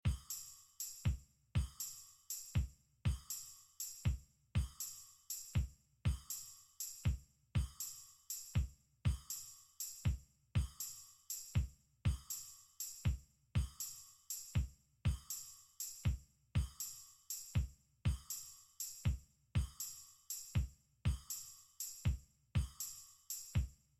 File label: drum Layer